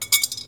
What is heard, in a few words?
Drop, INDUSTRIAL